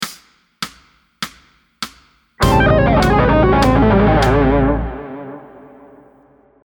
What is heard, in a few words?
Lick